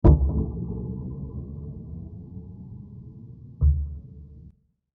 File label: Low Impact Hit With Decay; impact sound effect